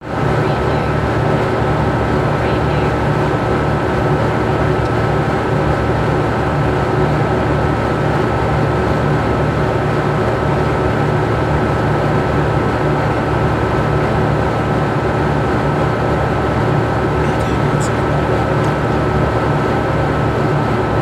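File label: Dump Truck Idle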